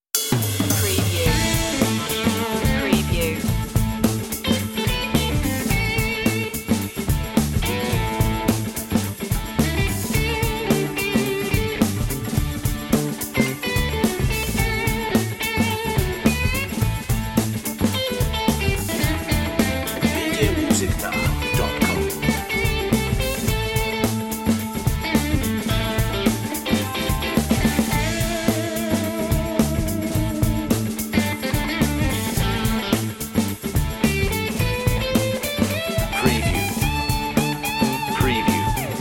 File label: casino funk